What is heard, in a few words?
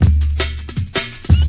162 whitebread jungle; 161 to 180 bpm